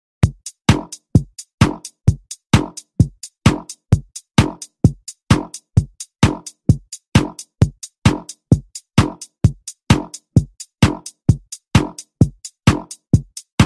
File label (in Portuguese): eletrofunk; som automotivo